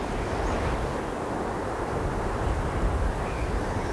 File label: snowstorm, environment; Tribes2